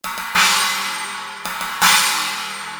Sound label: sabian 17 aa xtreme chinese